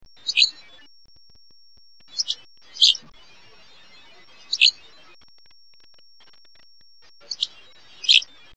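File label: Hammond's Flycatcher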